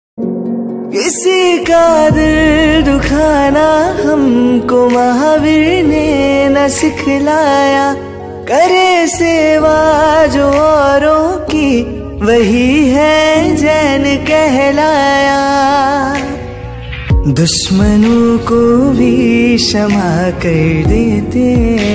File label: jain song ringtone; mahavir jayanti